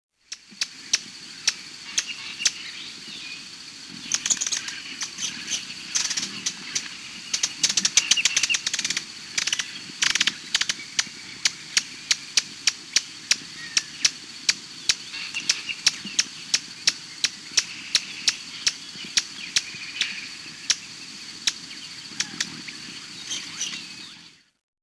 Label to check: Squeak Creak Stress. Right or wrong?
Wrong